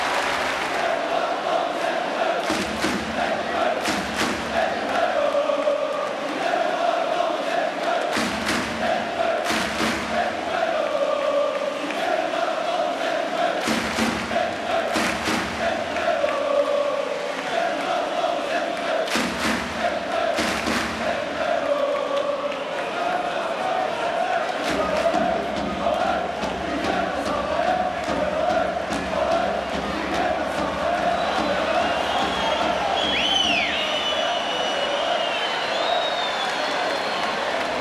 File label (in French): Chants; lisbonne